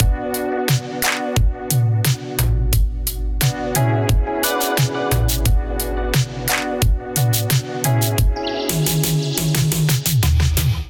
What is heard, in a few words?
Sun Wash Kit Melodic 88 bpm, Drums; MIDI Clips; Drive and Glow; Ableton; Factory Packs